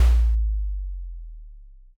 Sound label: odaiko